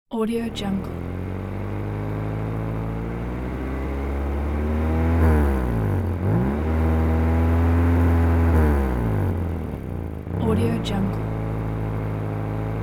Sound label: rattling car maneuvring exhaust sound